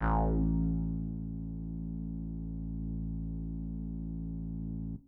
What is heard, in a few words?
bass